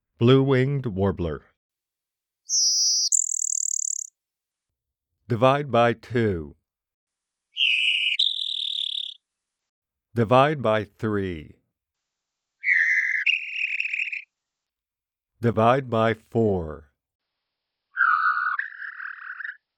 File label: Blue winged Warbler